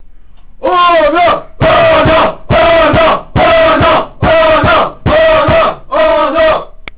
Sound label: call